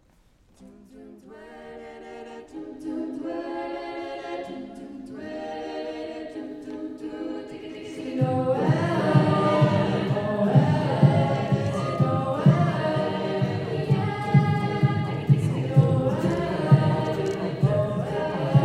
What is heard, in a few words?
jingle, choir